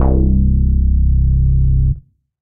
Bass

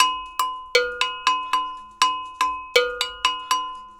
120 African Agogo; World; African Percussion

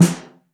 Snare Mono

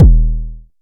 analog attacked, Kickbank; Ableton Foundation FreePacks

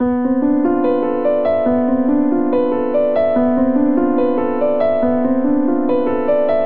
Ostinato Modal B Locrian 72 bpm, Grand Piano; MIDI Clips; Ableton

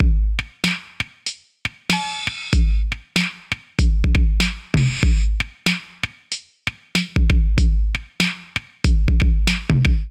808 Kit 95 Bpm, Drums; MIDI Clips; Amplify; Ableton; Factory Packs; Vibrations; ModeAudio

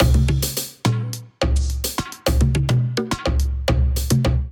Hand Drums Kit groove 106 bpm; MIDI Clips; Ableton; Drum Essentials

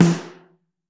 Mono, Snare; Ableton Foundation FreePacks; Snare Designer

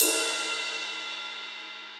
Ride Big Punchy; Drum Hits; Ableton; Factory Packs; Session Drums Club; MPE Hits

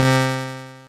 Metal Grip; Ableton; Factory Packs; Retro Synths; P-50 Linear; P-50 Structures; P-50 Partials

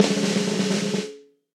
Snare Press OldSchool; Snare Articulation; Drum Hits; Session Drums Studio; Standard Hits

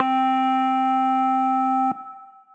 Synth Lead

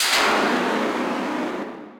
Dark Metalic, effects; Ableton; Glitch and Wash

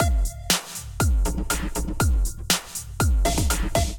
Kit; Drums; Fog Juice; Ableton; Build and Drop

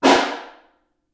Far, Snare

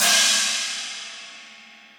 China Studio Basic, Cymbal; Drums; Drum Hits; Standard Hits; Session Drums Studio

